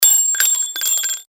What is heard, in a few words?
bullet shell drop